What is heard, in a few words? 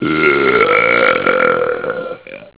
belch